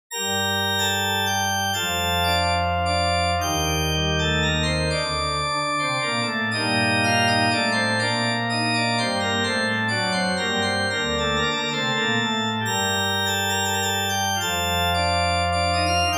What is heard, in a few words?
Church Organ B, Minuetto in g minor, reverberated; Andreas Instrument Sounds AB